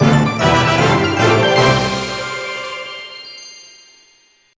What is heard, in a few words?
card upgrade